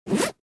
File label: Coat zipper open or close